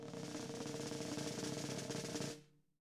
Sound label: roll, drum